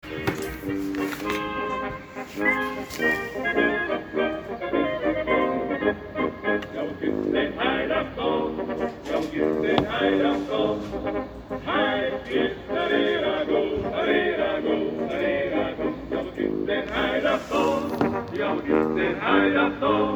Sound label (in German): Schlager klingelton